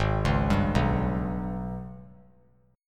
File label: Ab Minor Major 7th Double Flat 5th, down; AbmM7bb5; arpeggiated